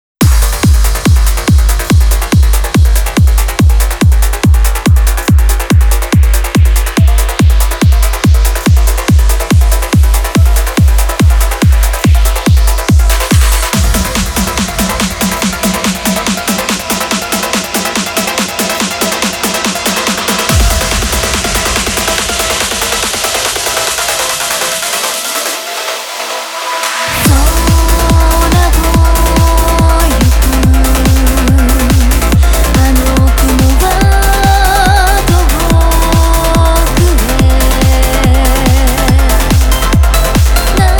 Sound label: Trance mix; Super Forest Beat vol11 2020